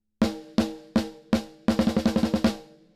Smooth Snare; Pulsar 1178

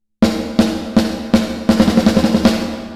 Snare; Reverb Compare; Breeze

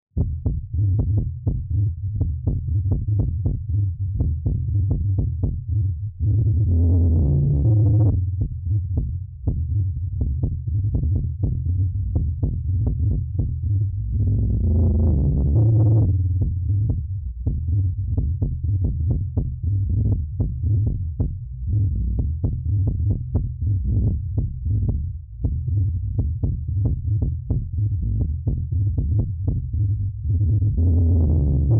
HARMONICS DRIVE LOW PASS 1000; RootOne

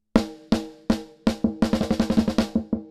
Delay DELAY TIME long

